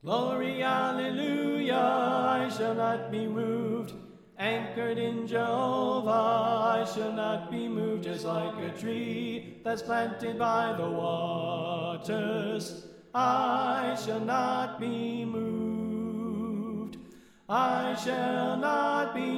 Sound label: Tenor Left